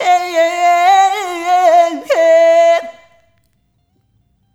Rhythmic A

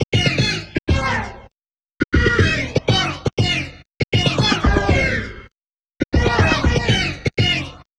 Guitar Based; Eclectic Electronica

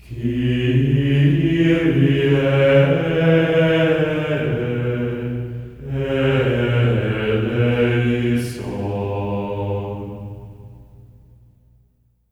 KYRIE C#4, voices; Spectrasonics; Symphony of Voices; GR KYRIE

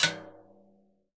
CASCARA, Synclavier Percussion; ILIO; Synclavier Percussion 2; World & Orchestral

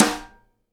SIDE STICK, Live Snares

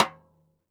xstick - snares off; JazzFunkKit

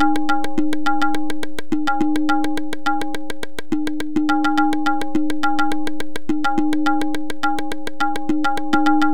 Hi Tabla 105 D#; Percussion Elements

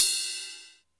Cym Edge Bell, Drum Machines Alesis D4

